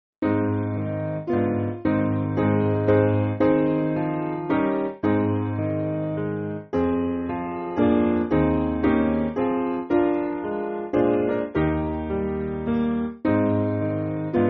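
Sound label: Piano, Music Backing Files for Churches; Holy Spirit Light; Mercy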